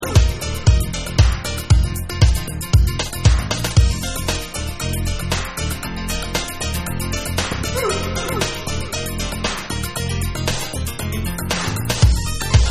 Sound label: Piano Party Mix